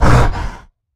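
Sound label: Stealth Attack Grapple; Evolve; Goliath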